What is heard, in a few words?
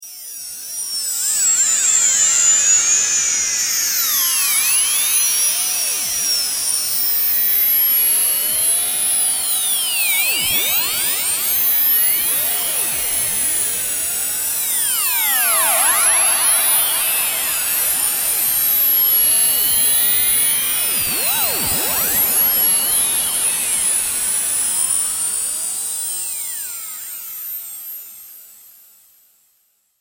Space Radio, Eerie Sci-Fi radio frequency; Tech